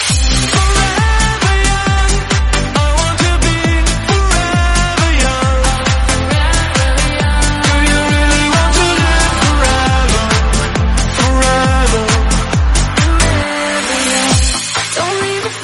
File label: 135bpm Gm